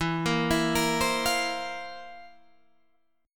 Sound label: F Major 7th Suspended 4th E